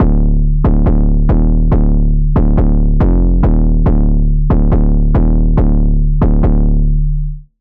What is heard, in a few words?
808, Sylenth1; 808 - Light Smoke; Routed Sound; Sylenth1 Expansion - Street Vanquisher Vol.1